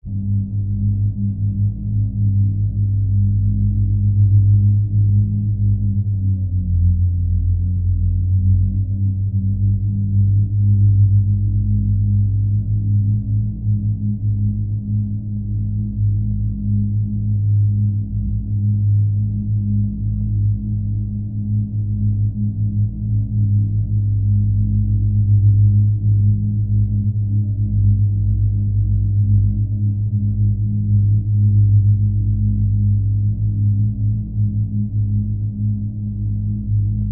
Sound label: Ambiance Bass, drones & tones